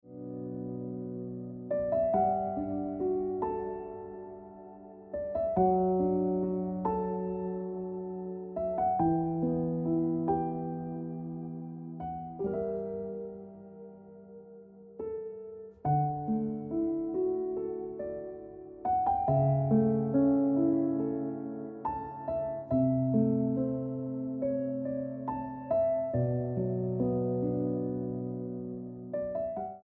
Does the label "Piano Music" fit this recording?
Yes